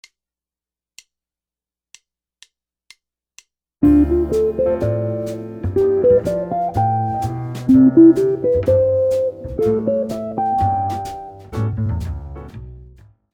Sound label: easy jazz arpeggios, guitar